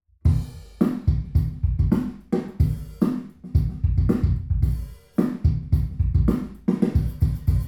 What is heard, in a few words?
drums binaural